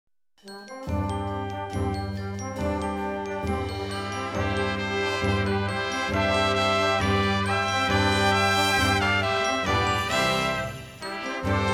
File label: Christmas Brass Medley